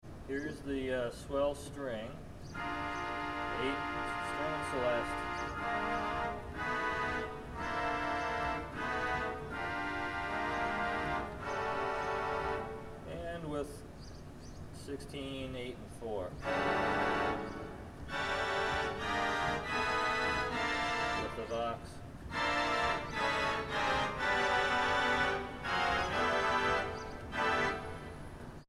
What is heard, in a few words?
rank demo swell string